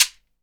SHEKERE, Synclavier Percussion, World & Orchestral; ILIO